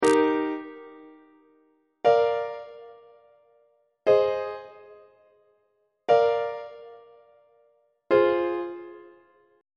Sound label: d sharp major deceptive cadence on treble clef